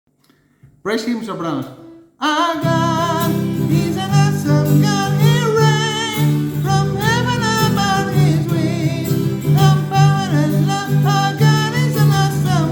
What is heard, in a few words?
praise him soprano